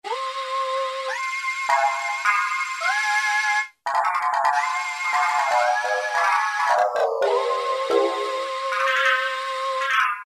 Okinawa Session, Roland PN-D50; Roland PN-D50-04

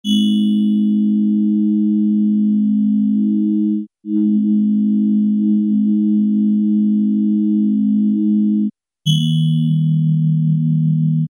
Star Chime, Alesis airSynth

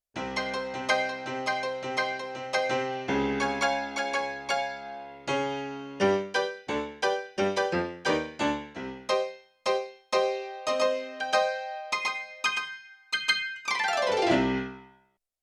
Octave Bright, Yamaha CFIII Grand Piano; Yamaha TX16W

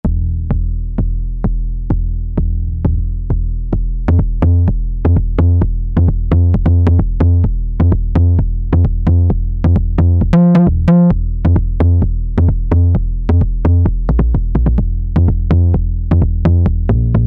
Kick

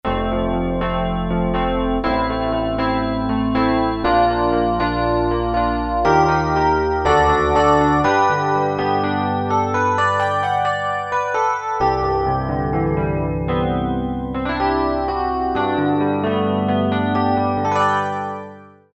E. Piano, Electric Piano, Korg DSS-1

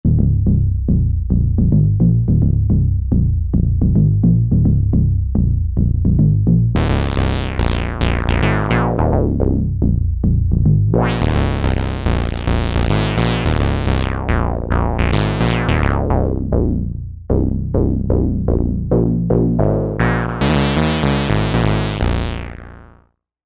deep bass

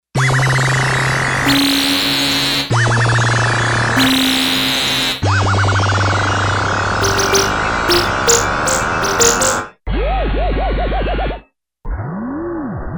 Gated FX

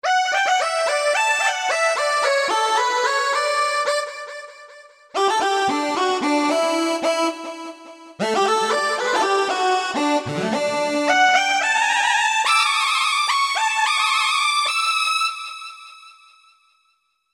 Lead, Roland U-220; Factory Patches; Lunar Lead

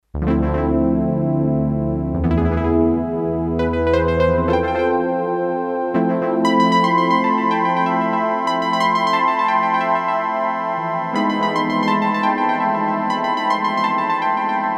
Panning Echo, Roland D-10; Factory Patches

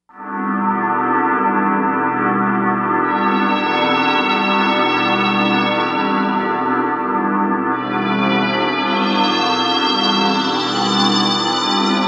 Cymbell, Korg M1; Factory Patches

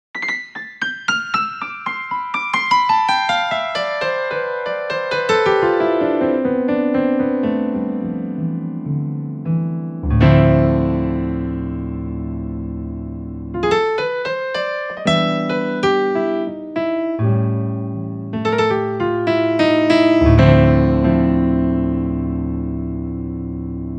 Acoustic Piano

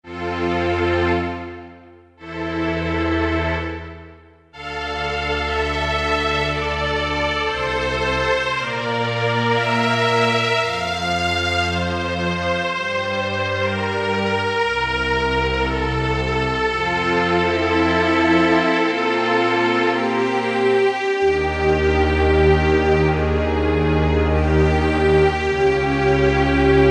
Slow Strings, Roland SC-50 Sound Canvas; Factory sounds

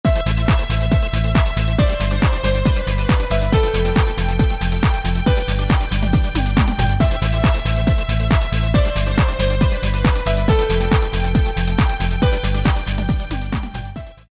Progressive

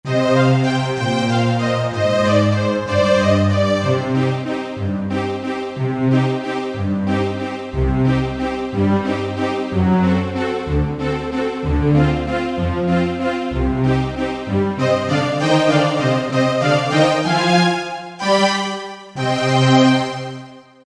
Pad Strings, E-mu Morpheus; Factory Presets

